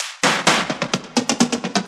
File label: Fill Ins 128BPM; Vengeance Samples